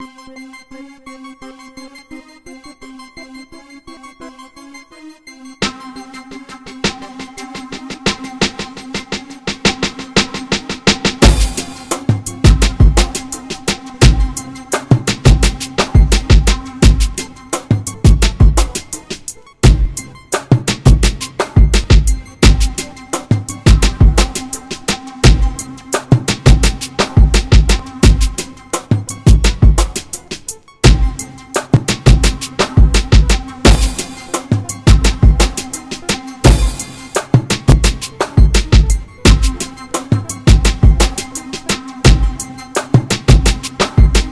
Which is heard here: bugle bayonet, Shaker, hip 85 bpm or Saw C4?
hip 85 bpm